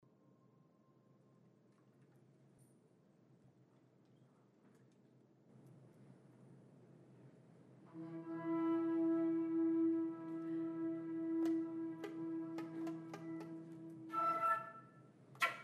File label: Wolf Pipe; A3 Animalis; Chamberlain